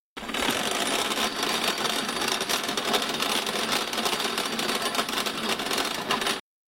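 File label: metal cable sounds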